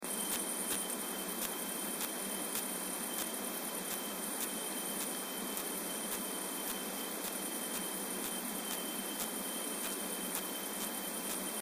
Rhacocleis baccettii; BIOAC ORTH

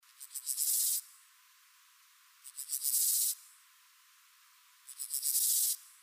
Chorthippus dorsatus; BIOAC ORTH

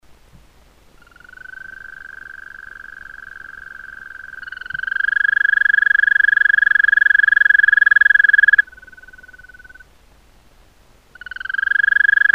Bufo viridis; BIOAC AMPH

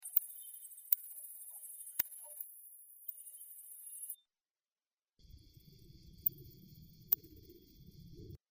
Tylopsis lilifolia; BIOAC ORTH

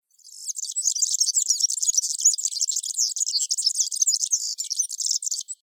Serinus serinus; BIOAC AVES

